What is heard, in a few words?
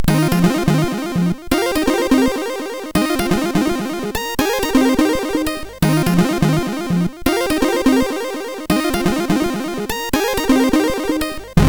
Blue Blues, MOS6581R4